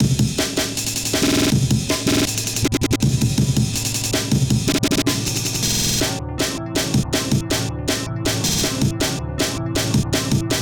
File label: breaks